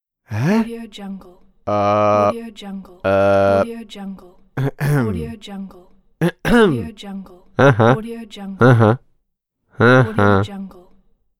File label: male voice expressions doubt